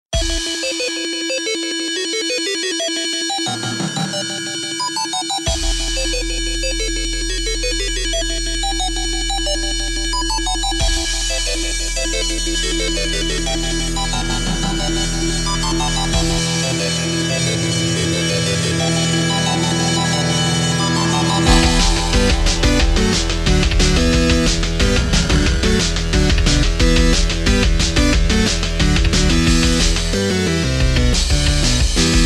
8bit; raid of the steel airforce

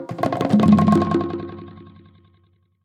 mariachi drums; WrestleQuest

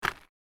Stone Destroying

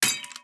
glass hit

